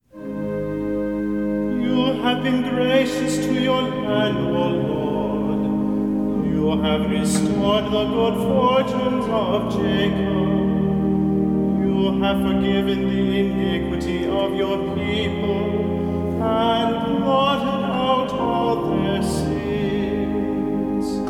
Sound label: Psalm Ang Chant